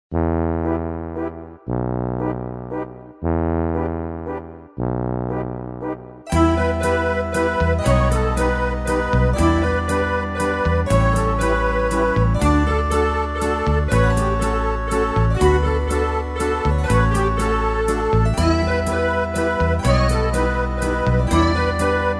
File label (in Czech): Karaoke